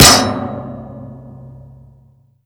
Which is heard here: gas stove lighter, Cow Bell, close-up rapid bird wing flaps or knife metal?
knife metal